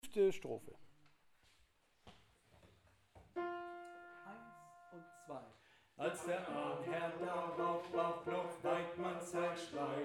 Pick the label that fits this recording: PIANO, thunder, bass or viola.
bass